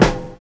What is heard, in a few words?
NO RING; Univers Sons